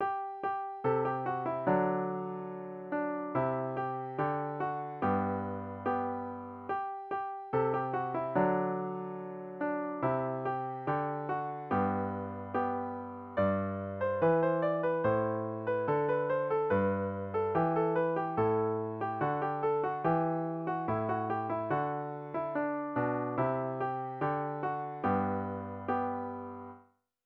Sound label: piano